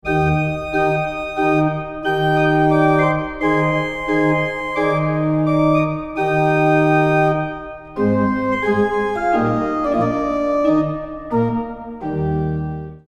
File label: Resonance Organ Repositioned